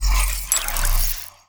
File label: ui round fail